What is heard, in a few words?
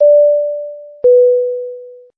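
cabin call; A320; Announce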